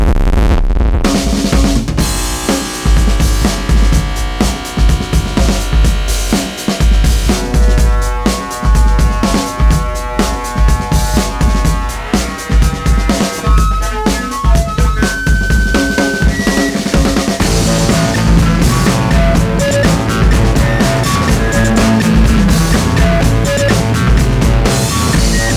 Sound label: Casio Bossa Nova